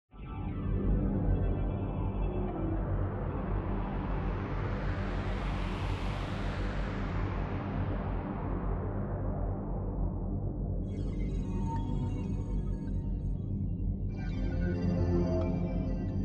ambiance; Kaos

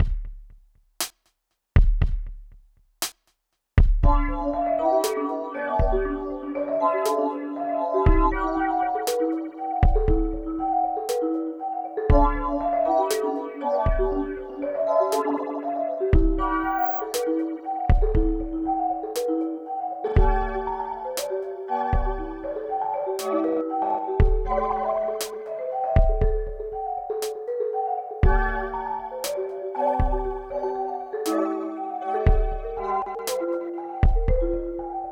Elevator Floor; Splatoon 3; Side Order

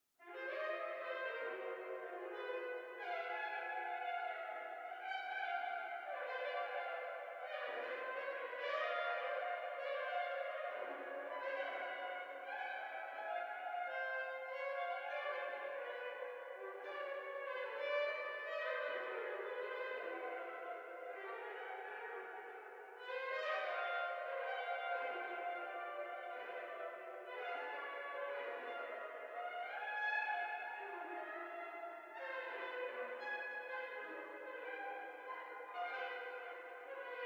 Freejazz; To Hell with the Ugly

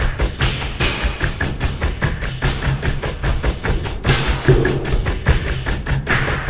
Lake beat; Torchlight III; PS4

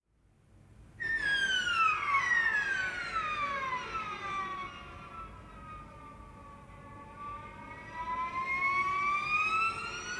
Savannah Music

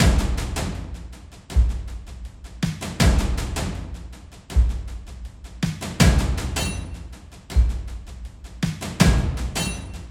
Percussion Loop; Dual Blade: Battle of the Female Ninja

